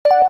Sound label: Keytone hot; LG SV850 Wine 4